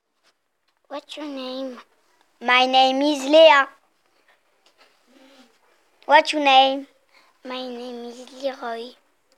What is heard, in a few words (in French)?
vocal